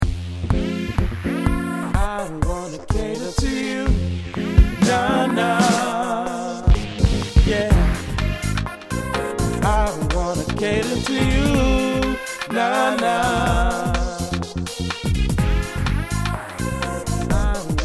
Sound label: Song Instrumental